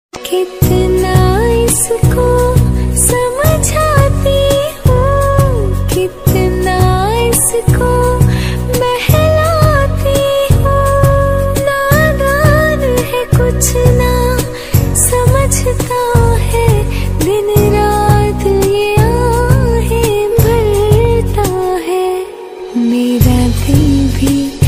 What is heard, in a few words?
Female Song Ringtone